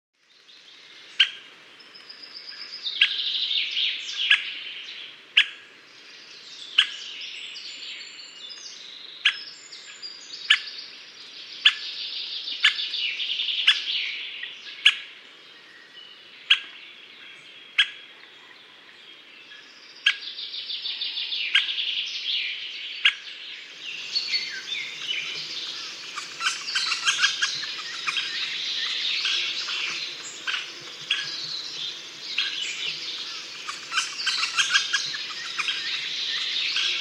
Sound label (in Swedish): vitryggig hackspett; fagelljud